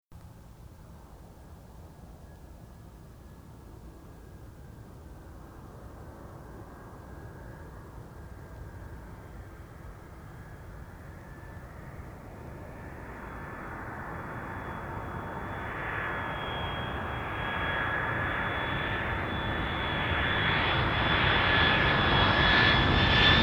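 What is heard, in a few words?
Landing A300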